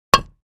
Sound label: Patio Square Impact, concrete & brick